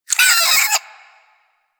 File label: Vocal Pain; Warframe; Hunting Critters; Cryptilex